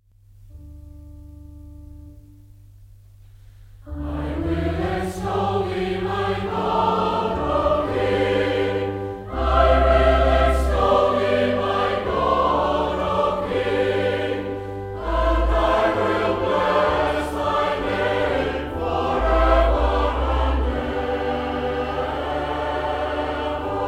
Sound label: Choir, hymns